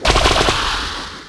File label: aimed shower, effect; npc